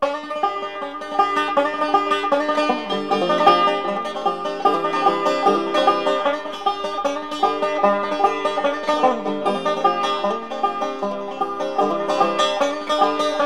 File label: Banjo Tune; Nancy Drew: The Secret of Shadow Ranch; PC 2004